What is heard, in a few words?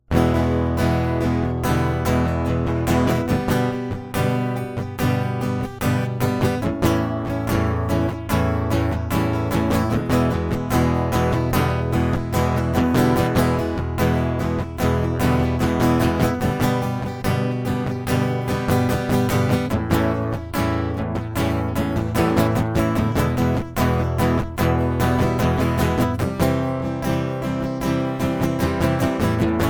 acoustic; MegaEpix